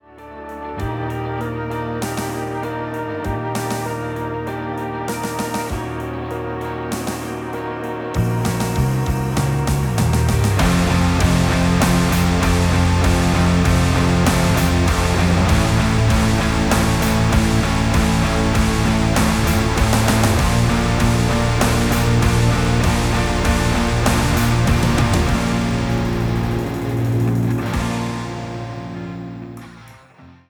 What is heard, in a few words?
Creep Rock, music for videogames